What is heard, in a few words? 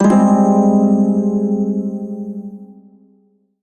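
Prime Shawzin Minor Chord, Music; Warframe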